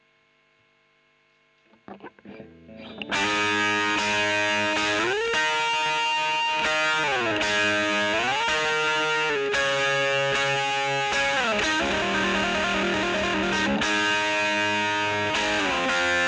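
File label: E.GTR OD, electric guitar overdrive; Royer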